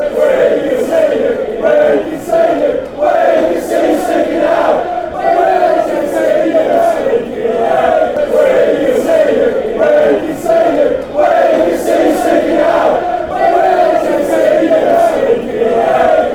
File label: sneaking out, fanchants